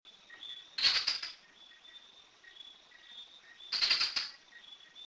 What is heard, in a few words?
aristelliger praesignis, Jamaica; windsor; West Indian